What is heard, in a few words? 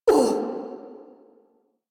Pain, Barks; Warframe; Corpus Lich; Persona D